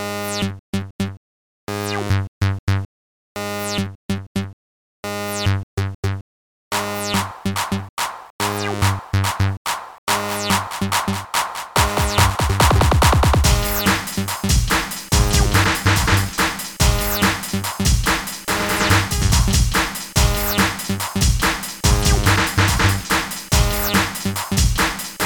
Noise; Break the Noise; Impulse Tracker 2.05

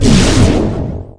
RIPPLE; Messiah; FIELDS

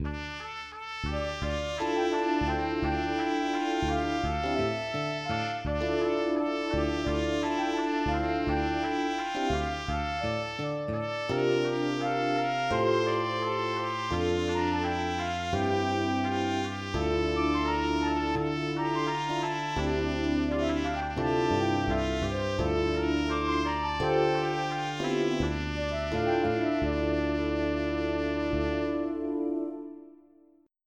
tune; 40 Best Windows 95 Games; SoftKey; TLC Properties

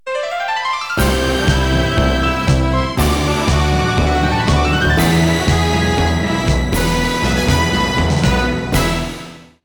fanfare; KABOOM! - Wild and Wacky Sound Software; music

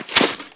step rubble; SpecOps2 MPlayer Demo

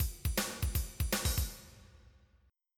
blues; Sounds Terrific II

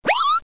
flip; Bible Fun Pack

